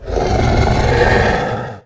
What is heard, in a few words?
chimaera pissed